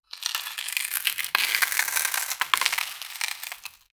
solid particles in solid

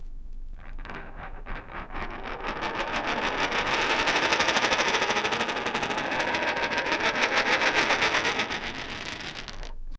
plastic ball rolling in

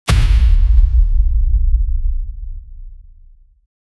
godlike aura strike deep

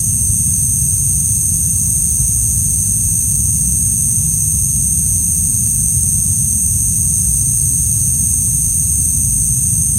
loopable plasma torch

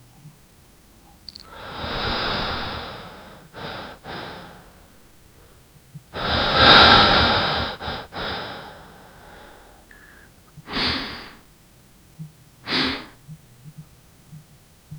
one continuous inhale, breath

